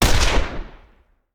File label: Rifle; Sound Ideas; Guns